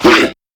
Pain Crewmen; Warframe; CorpusCrewmen; CrewmanA

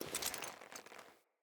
Foley Move Short; Friendly; Deora

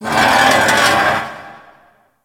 Metal Scrape; Ambience; Props; Warframe; Orokin Derelict

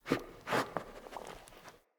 Battle Dog Sniff, Animals; Vocals; Snc Battle Dog

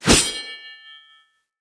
Sword Swipe, Whooshes; Weapons; Melee

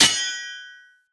Short Sword Medium Parry Impact

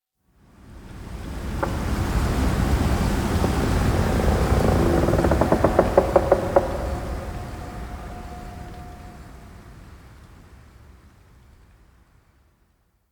Root Cave Skylight Large Wind Gust; Ambience; Props; Neathuns Root Cave

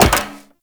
Wall Jump Metal Perforated; Footsteps; WallRun; Warframe; Characters; Tenno

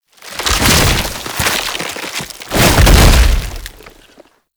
Death Foley; Enemies; Gameplay; Boss; Warframe; Oraxia

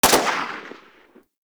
Fire Mech; Warframe; Braton MK One Redux

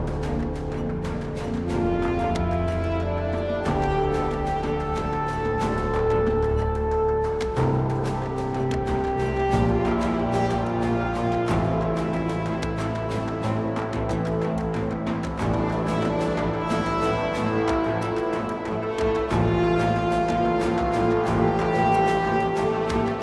Ambient, Music; Teshin; Warframe; Duviri; TennoCon2022

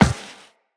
Walk Metal, Footsteps; Operator

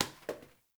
Janitor Paper Towel Drop; Warframe; Ambience; NineteenNinetyNine

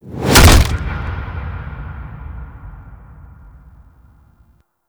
Fall From Cryo Land; Warframe; Tutorial; Tenno; Cinematics

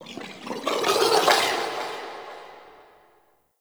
Sentient Lair Exterior Creature; Warframe

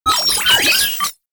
Sniper Drone Vocal; Lasrian Drones

